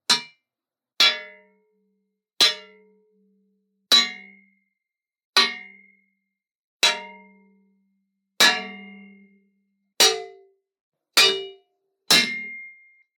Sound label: Metal Frame